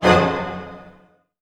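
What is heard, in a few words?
C diminished pianissimo, orchestra hits; Sound On Sound - Peter Siedleczek's Orchestra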